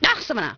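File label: female angry; People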